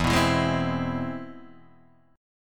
C Augmented Major 7th E

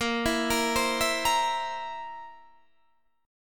Bb Suspended 2nd Flat 5th Bb down Guitar Standard; arpeggiated

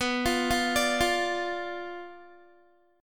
B Diminished B down Guitar; arpeggiated; tuning 4F,4A,5C,5G,5C,6E

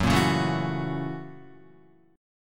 Gb 7th Sharp 9th Gb Guitar; strummed; Gb7#9; 1,1,1,2,4,2; JGuitar

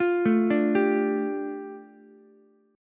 A 7th Suspended 4th Sharp 5th, A, down, Ukulele; A7sus4#5 arpeggiated

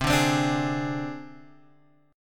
C Suspended 2nd Sharp 5th C down Guitar Open G

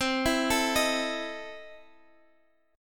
E Major 7th Suspended 4th Sharp 5th

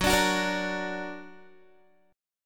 F sharp Augmented, down, Guitar; strummed; 4E,4A,5D,5G,5C,6F

